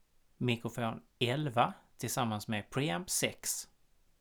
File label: mic pre